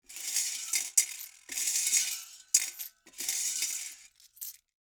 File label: coins pick up